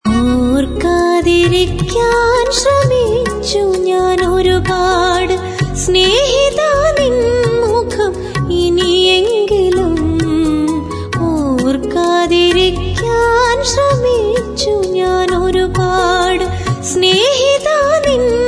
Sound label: Female